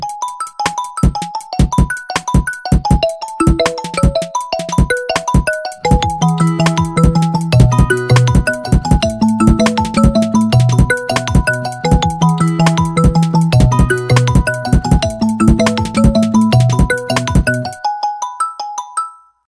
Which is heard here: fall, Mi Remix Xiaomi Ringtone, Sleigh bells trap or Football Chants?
Mi Remix Xiaomi Ringtone